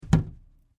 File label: Cardboard box hit